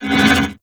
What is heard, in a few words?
Void Portal Rotate One Off